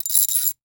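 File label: Ratchet; 1001 Sound Effects; Tools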